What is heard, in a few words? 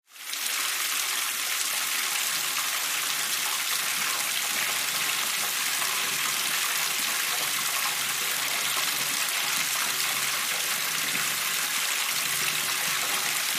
Wash Basin Metal, Heavy Stream